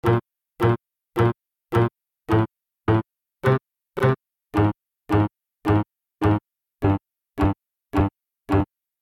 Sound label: melody